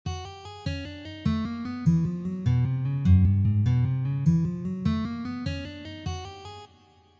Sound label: Hammer On